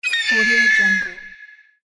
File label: eagle